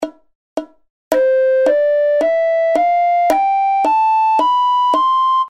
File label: recorder notes